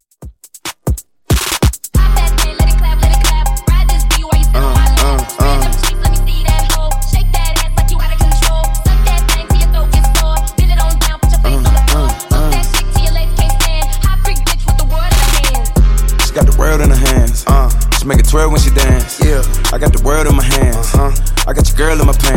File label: Intro Outro 139bpm